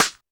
Snare, Roland TD-20

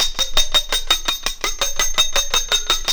CHINA SPOON; Zero G - Ethnic; CHINA SPOONS

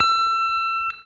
Guitar & Bass; Quantum Leap

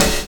JAZZ SOLO; Univers Sons - Kitch 70s

